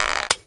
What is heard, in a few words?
cross eye; connor